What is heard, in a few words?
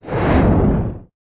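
Hit long range fire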